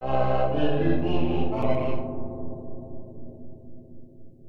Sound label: Midi CSound